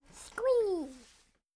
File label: Squee